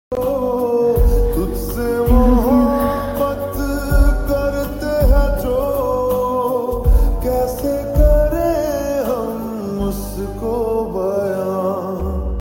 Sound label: slowed reverb lyrics